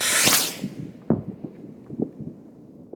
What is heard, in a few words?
firework single; best service Blue Box